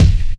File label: rich mids kick single hit e key; tuned drums e key